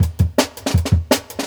Jungle Breaks; Original Jungle Breaks

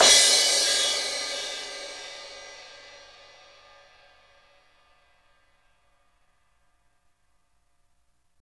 Roland MV8800, crash; RIDE CRASH

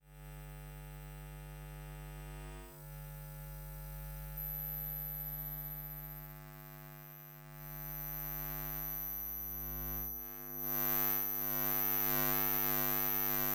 Electromagnetic Fields, Hummin; electric fields

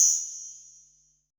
METAL; BPC PERC

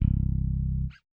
Fretless Bass; BLOOPFUTURE

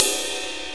Light Ride, Cymbals; Sonic Foundry (Sony Creative Software); Drum Tools

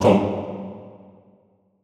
TONG G1, Classical Choir; Best Service; Extended Classical Choir; SHORT TONG

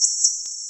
SHORT, SHORTNOIS

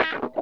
wah snip, Funk Gtr & Wah

